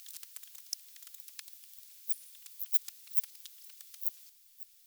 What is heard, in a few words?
Pipistrellus nathusii; europe